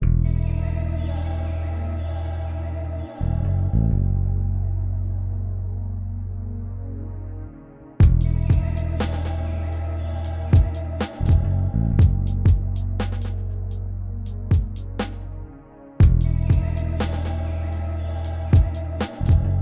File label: BEAT